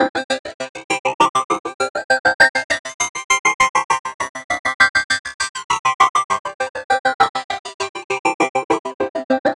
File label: synth 100 digigate C G; Transmission-X